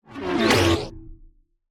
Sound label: energy charge; soundscrate